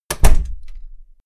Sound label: pulling door shut